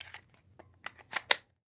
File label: Weapon Gun Pistol 9mm Glock Clip Insertion Slow; AdobeCC